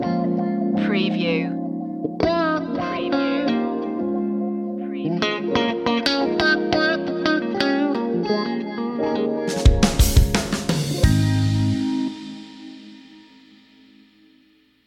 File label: funky love stinger